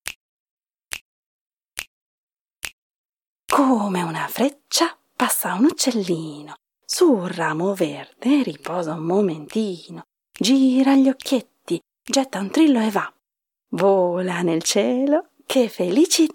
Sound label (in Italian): Luccellino